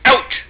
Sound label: ouch; xbomber